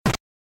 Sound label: enemy slap